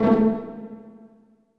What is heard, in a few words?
A 2 PIZZ M, HALL STRINGS; AKAI S-Series CD-ROM Sound Library VOL-7